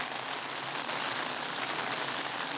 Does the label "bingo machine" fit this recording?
Yes